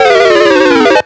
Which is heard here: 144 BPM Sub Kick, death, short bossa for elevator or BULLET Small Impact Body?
death